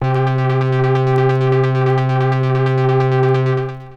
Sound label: Sub37 Triplet Vinyl Synths C2; Sub37 Blade Triplet; vinyl leads